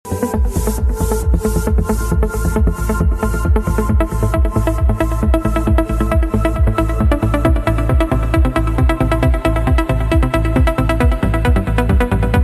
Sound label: melodic trance